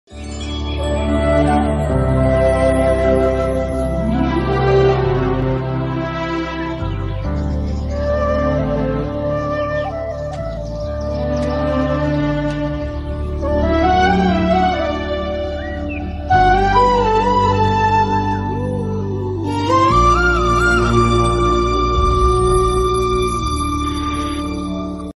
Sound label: romantic bgm; odiyan; mohanlal